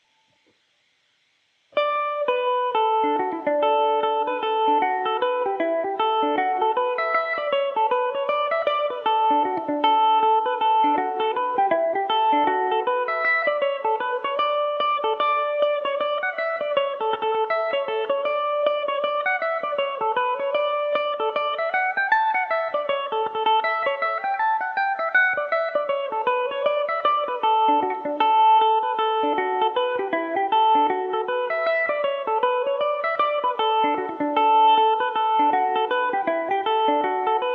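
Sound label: maids of kilmallock D major revelation, mandolin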